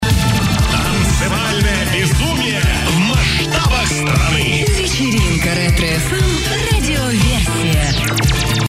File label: Retro FM; Vecerinka